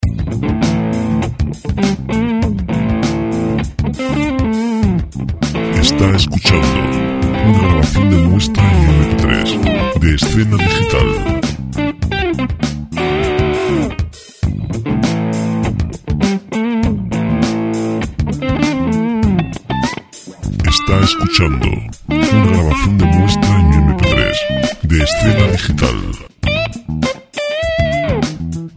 100 rock; Música de rock

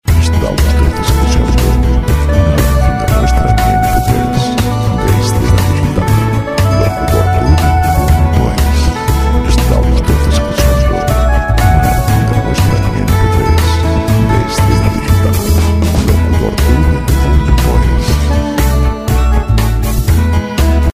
120 pop; Música pop

